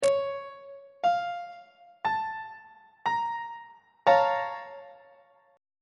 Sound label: a sharp minor major 7th 1st inversion; on treble clef